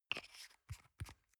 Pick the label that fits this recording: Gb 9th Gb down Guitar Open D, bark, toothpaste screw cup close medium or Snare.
toothpaste screw cup close medium